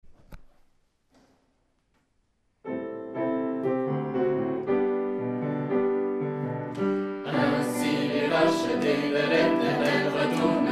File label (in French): Chant; FR